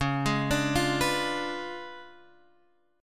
C sharp 7th Flat 9th, chord; C#7b9; arpeggiated; JGuitar

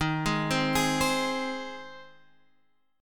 C Minor Sharp 5th

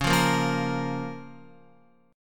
Db 6th Db, down, Guitar; Db6 strummed; JGuitar

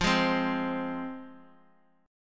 F Minor F down

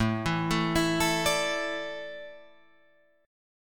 A Suspended 4th down Guitar Open F; Asus4 arpeggiated; Asus4 chord; JGuitar

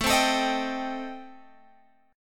A Minor 7th Flat 5th A, Guitar, Open G

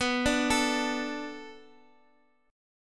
B Minor 7th B, Guitar, Half Step Down

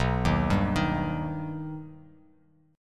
B Augmented B, chord; arpeggiated; JGuitar

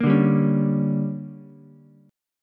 D Major 7th Suspended 4th Sharp 5th, Ukulele; DM7sus4#5; strummed; JGuitar

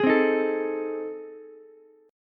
A Minor Major 7th Flat 5th A, Ukulele; strummed; AmM7b5; JGuitar

